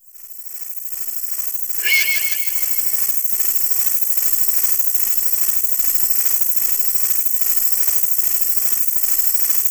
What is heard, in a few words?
INSECTS; AKAI Anime Sound FX; NIGHTMARE